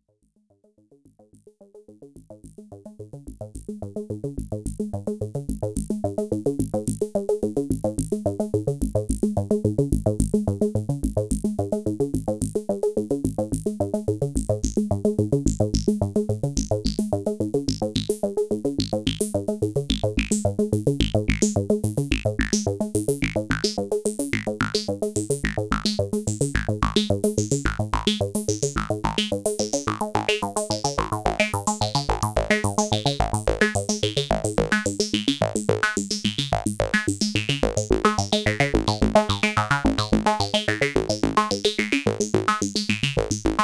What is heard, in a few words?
4 VCOs 12dB HP 24dB LP